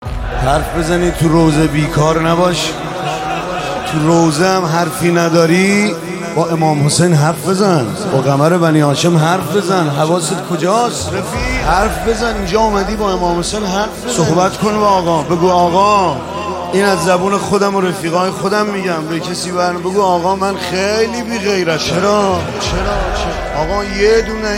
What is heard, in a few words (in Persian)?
روضه